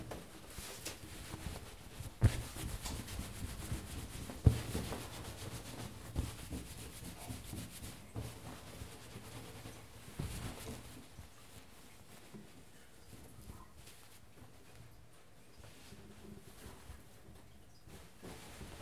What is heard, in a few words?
Towel